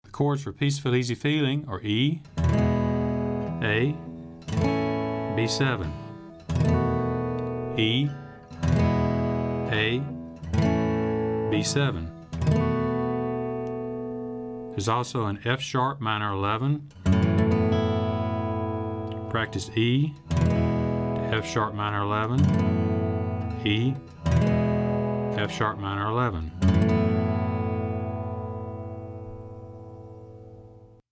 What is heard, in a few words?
Chords